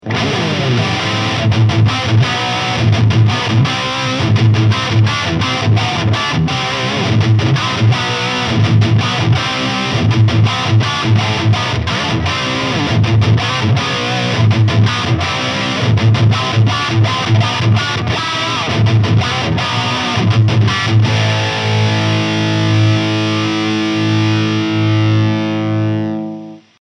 Mooer GTRS Guitars Wing 800 Int PB Heavy High Gain